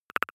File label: click stutter, signale; soundpool